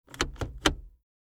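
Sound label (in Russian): звук закрытия багажника ключом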